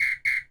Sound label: new visitor